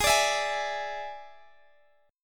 D Suspended 2nd Flat 5th G sharp

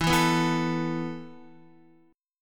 E Suspended 4th E down Guitar; strummed; 4F,4A,5C,5G,5C,6E; JGuitar

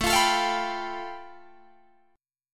A 7th Suspended 2nd Sharp 5th A, Guitar Drop D; 19,20,19,22,20,19

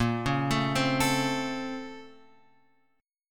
A sharp Minor Major 9th; A#mM9 Chord {6 4 7 5 X 5} arpeggiated

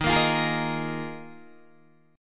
Eb Suspended 2nd Suspended 4th, Guitar, DADGAD

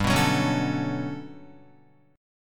G Major Sharp 11th, Guitar; strummed; JGuitar

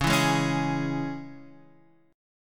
Db Minor Db down Guitar Half Step Down

